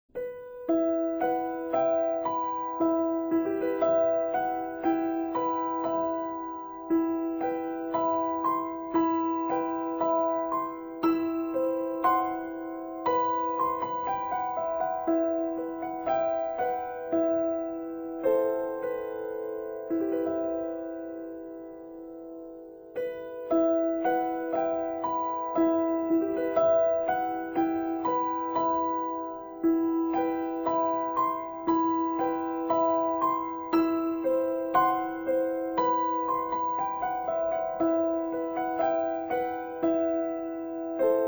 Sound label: Japanese Music Box; Itsuki No Komoriuta